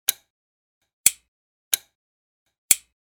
Vykljuchatel

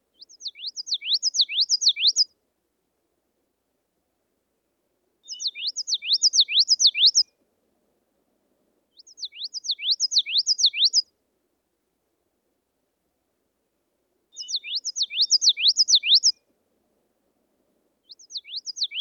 Kamyshovka

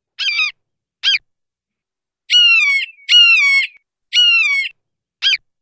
YAstreb

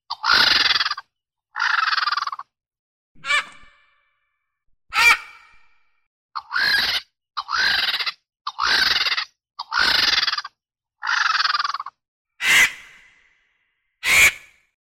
Stervyatnik

